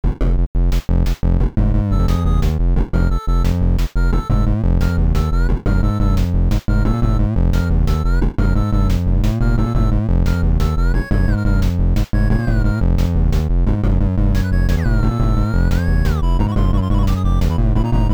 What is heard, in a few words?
jungle ambient; mindscape